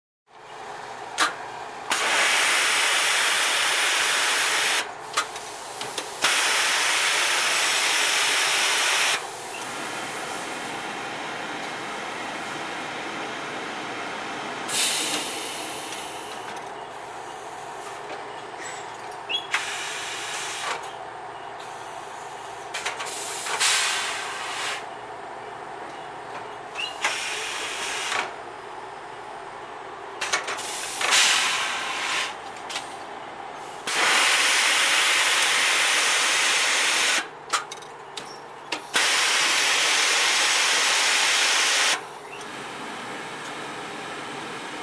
Industrial Hydraulic Exhaust

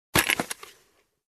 Sewing Tool Box cover close

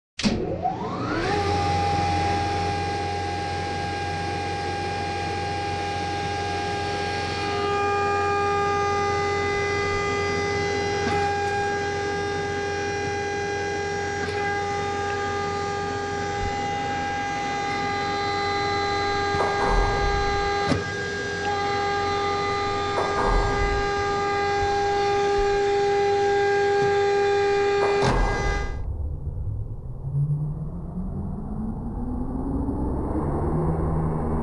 Industrial Mixer Machine Turned on

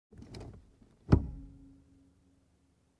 Car Seat Snapback

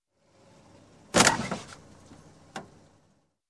Car Crash

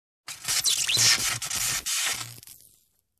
High voltage malfunction squeak

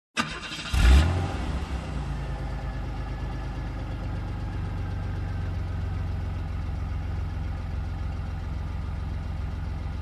Muscle Car Engine running

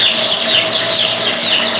Farm baby chicks chirping

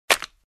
Empty plastic bottle smack

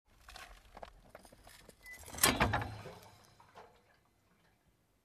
Motorcycle parking and securing

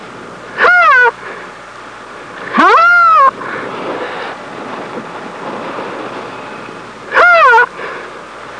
Rhino calf sound

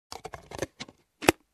Closing Can with plastic lid

